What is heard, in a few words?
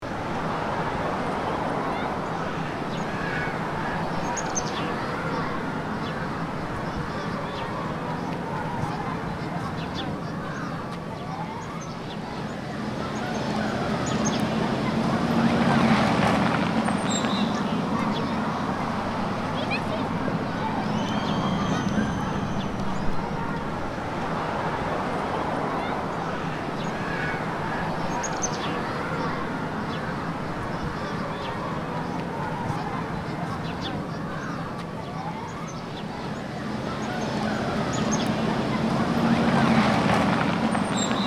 Urban playground by road kids playing traffic; gfxsounds